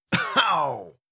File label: damage grunt male